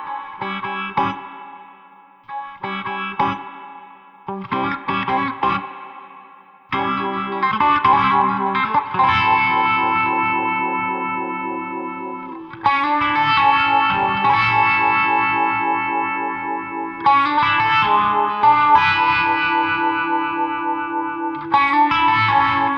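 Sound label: Blues Guitar; Pro Tools Essential Loops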